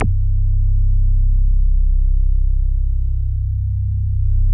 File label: MINIMOOG; Big Fish Audio - First Call Vintage Keyboards